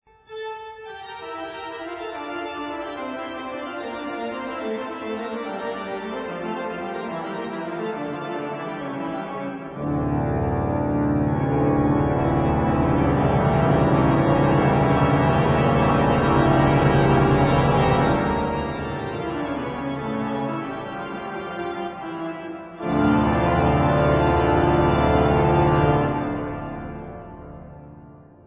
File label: coventry toccata fragmentje